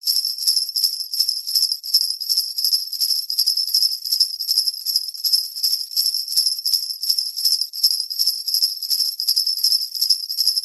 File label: musical sleigh bells med shake